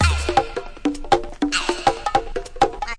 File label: 161 percusso solos, drum loops and breaks; 161 to 180 bpm